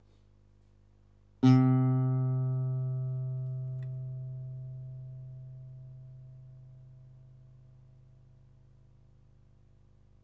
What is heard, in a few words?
guitar